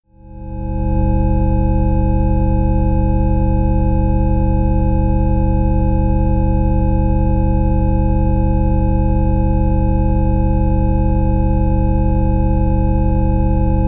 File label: Clarity Brainwave Balancing High Triangle